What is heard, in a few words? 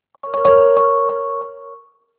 message alert